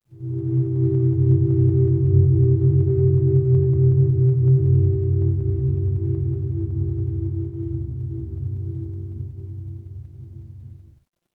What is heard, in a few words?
ambience room tone